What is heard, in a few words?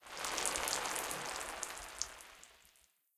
Watering Can